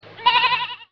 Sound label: baa